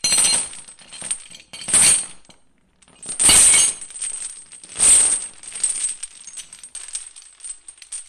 large truck chains

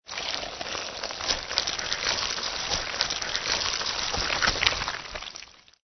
ANIMAL CREATURE

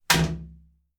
Toilet Seat Cover Down

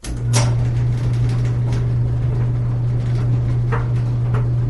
clothes dryer

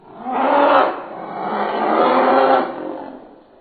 tyrannosaurus rex

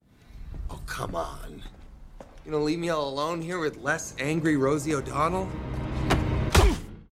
punched; deadpool

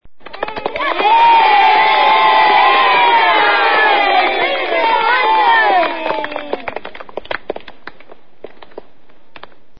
CHILD

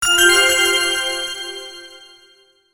ALERT

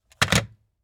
Phone Hang Up

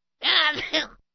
CARTOON SNEEZE

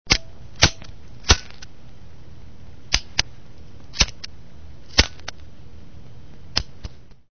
lighter

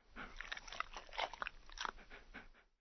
ANIMAL DOG LICKING

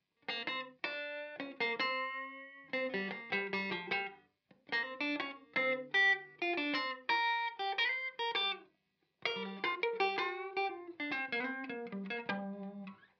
blues

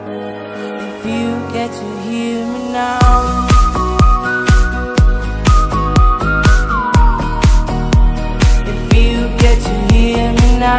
ringtones indie

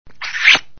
kiss

